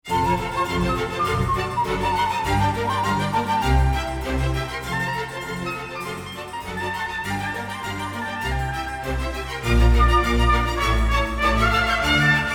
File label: Baroque Concerto